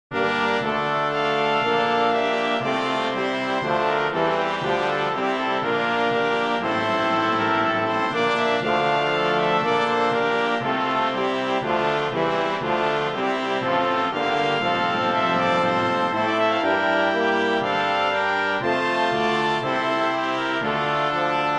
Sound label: Hymn BRASS